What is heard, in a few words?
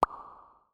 reel bounce; netent